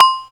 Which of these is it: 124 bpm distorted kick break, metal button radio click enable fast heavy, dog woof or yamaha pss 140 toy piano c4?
yamaha pss 140 toy piano c4